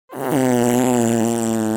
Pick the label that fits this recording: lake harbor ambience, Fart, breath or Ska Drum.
Fart